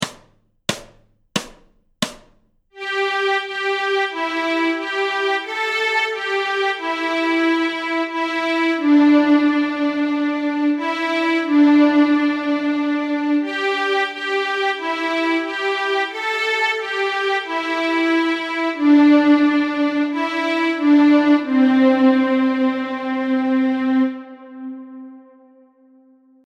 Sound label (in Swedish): Stråk C, Nyckelharpa; Camptown Ladies; A-strängen Steg 1 Två toner i dur